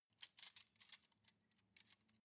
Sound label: cutlery cake forks handle